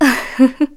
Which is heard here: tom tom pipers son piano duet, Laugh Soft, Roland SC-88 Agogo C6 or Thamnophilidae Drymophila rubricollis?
Laugh Soft